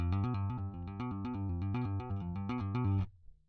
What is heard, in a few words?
bass